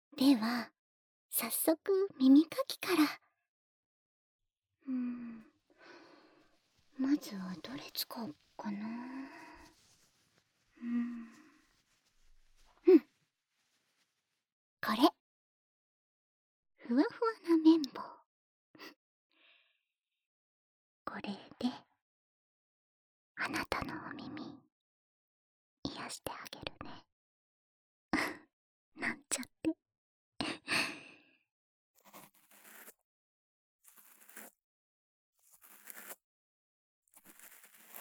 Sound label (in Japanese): 耳かき ASMR ごっこ 右耳; ２年B組・電気未来編